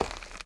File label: Footsteps Dirt